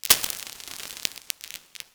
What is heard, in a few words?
loop klikk